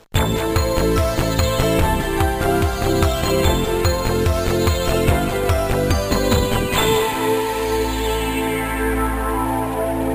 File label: Bonus Fanfare; putt putt and pep s balloon o rama